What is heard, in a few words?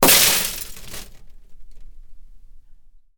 break; pyntnclick